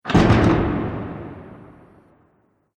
Haunted House Door Slam; Creepy Door

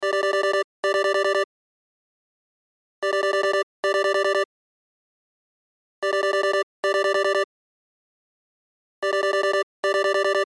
Cell Ringtone

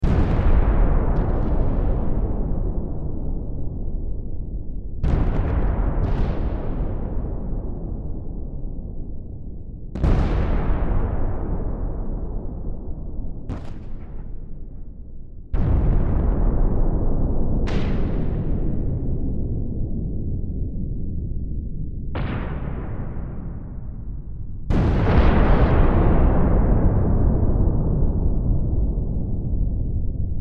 Explosions Distant Ambience Battlefield War Loop; Military; Warfare; Blastwave

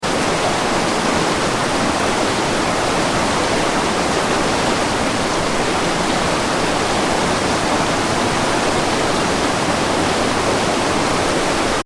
water river shallow fast; River - Shallow , Fast Flowing; Nature

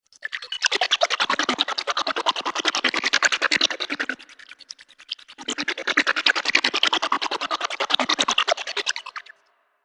Sloshing Water, Sound of water rapidly sloshing.; Warped; Sound Design

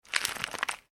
Plastic Packaging Movement

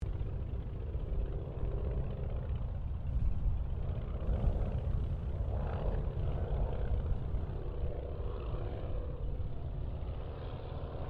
Ryan Ryan Auster pass; Mixed aircraft squadrons; Airplanes; Vehicles

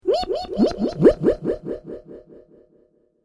Created Creature Small Ping, Alien Sound Effects

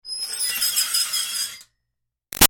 Screech Metal Iron Board Shrill; Ironing Board; Materials

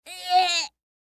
Human Gag; Throat Gag Male Seven Yrs Boy; Gagging; Blastwave

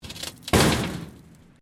METAL SHEET MOVEMENT; Materials

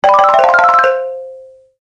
Deep Bonus Win, Video Game Sound Effects; Twistedtracks

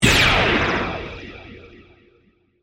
Hit Laser Blast, Warble; Production Element, Imaging Element, Accent, Transition

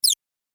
Multimedia Squeaky Beeper

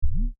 Beep Electronic Bass Single Variant; User interface feedback sounds; Beeps; Multimedia

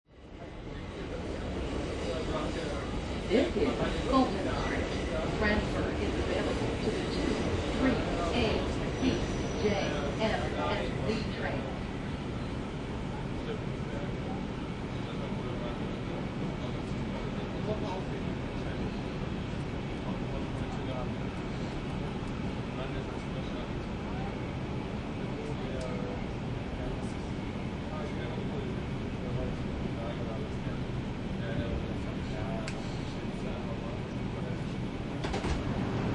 Subway Train Interior, riding onboard NYC Subway; Subway Train interior, riding onboard NYC Subway station. Announcer calling station names and transfers. Doors opening an

